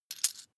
coin big drop heavy

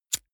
lighter wheel spin

